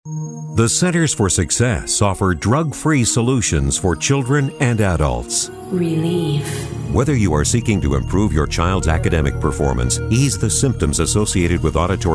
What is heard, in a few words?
Uplifting